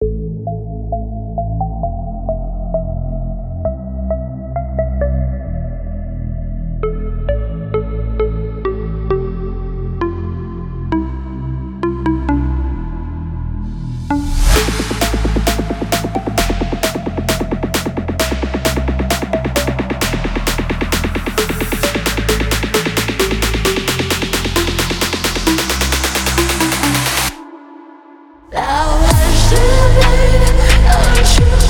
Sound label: bass slowed remix